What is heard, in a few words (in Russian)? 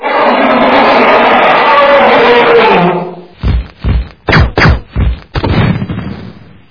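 монстр шумный массовый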